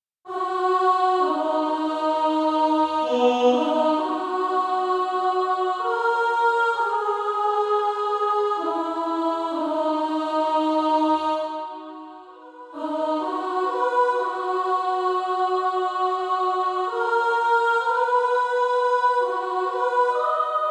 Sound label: Female Choir